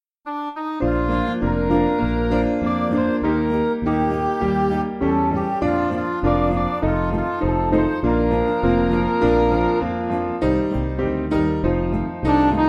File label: Hallelujah I've Been Happy, Midi